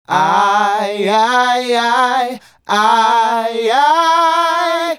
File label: Male Group, Vocal